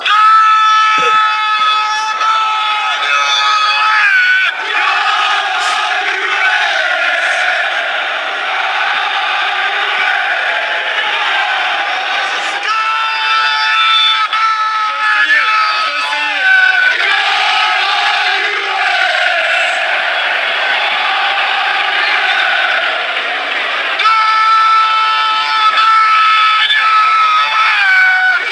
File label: come on you reds